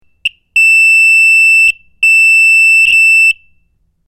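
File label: Alarm Electronic Beep; hi-tech-various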